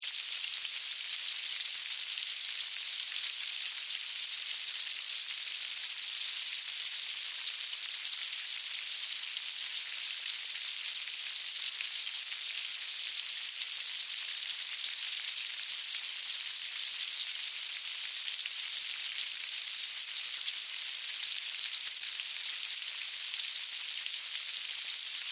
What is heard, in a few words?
Fire Ants, Fire, Ants, Colony, Bugs, Movement, Insects; Sound Effects:Animals:Insects; Blastwave